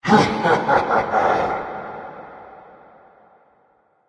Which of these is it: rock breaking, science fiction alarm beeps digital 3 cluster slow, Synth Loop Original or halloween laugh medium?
halloween laugh medium